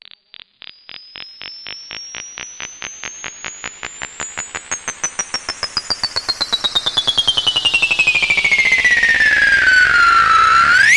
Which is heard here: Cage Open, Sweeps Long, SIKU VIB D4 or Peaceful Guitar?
Sweeps Long